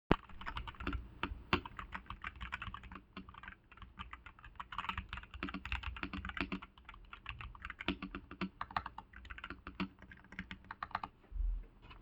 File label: Asus ROG Azoth typing sounds